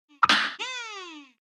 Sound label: parry slap; cuphead